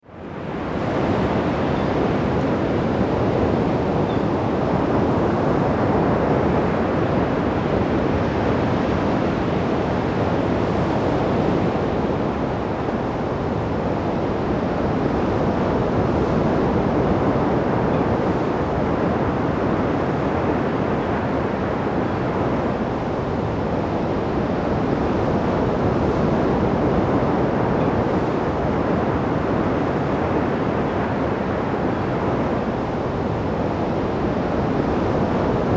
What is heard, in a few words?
Water Ocean